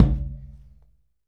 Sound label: Conga Slap Low; One Shots; Essential Percussion